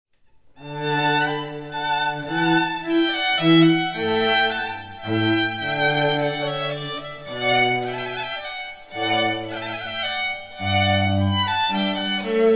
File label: trio minuet